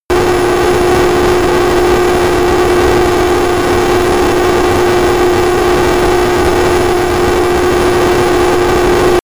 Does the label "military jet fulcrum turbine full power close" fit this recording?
Yes